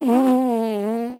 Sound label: fart